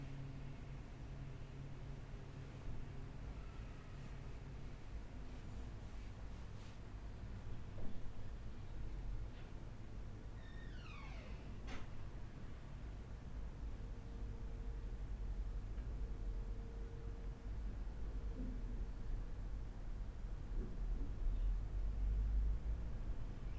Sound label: Room Tone Quiet